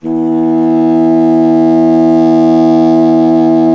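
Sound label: wind contrabassoon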